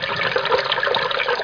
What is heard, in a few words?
PIPE HOLE; Frogger 1997